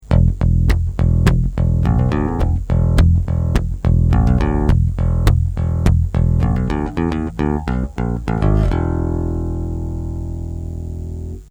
Ampeg SVT Pick

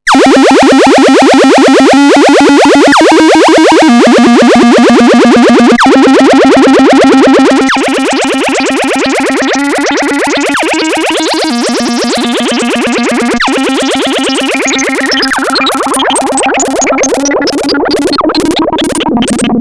Step LFO

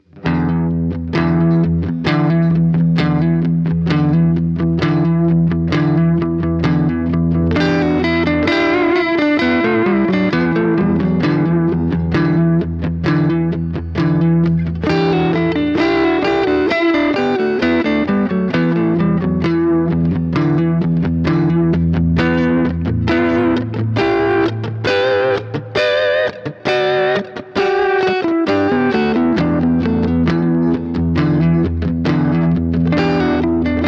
Direct Pedalboard; Vox MV50

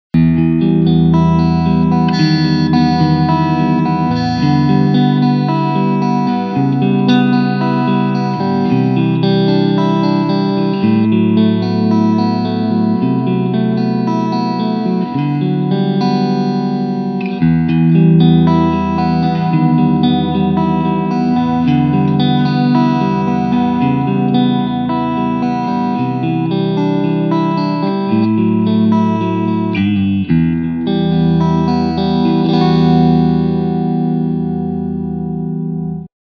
Chorus; Seafoam

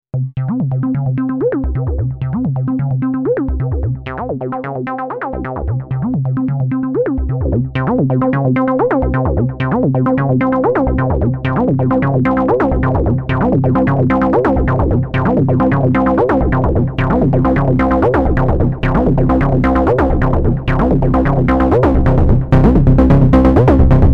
VCOs Sub Ring Feedback; Xenophone

